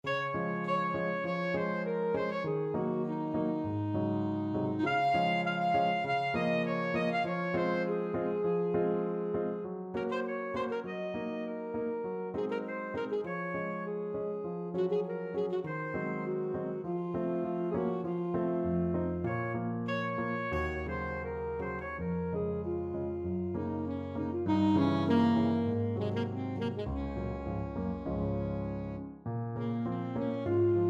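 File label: alto saxophone; elgar; chanson de matin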